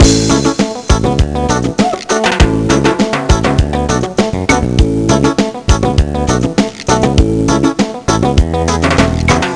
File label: Reggae; Cool Protection v1.5.1